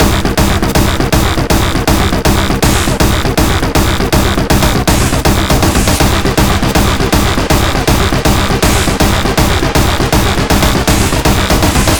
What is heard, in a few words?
Beats Loop; Anger Foot Demo; Angerfoot Beats 07b